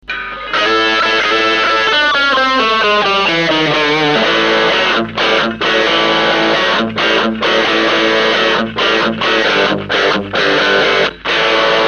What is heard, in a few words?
jcm A